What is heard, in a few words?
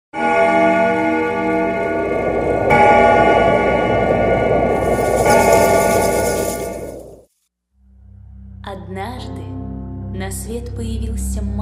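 vocaloid russian cover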